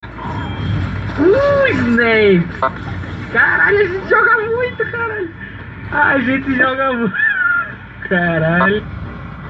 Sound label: uuuhhhh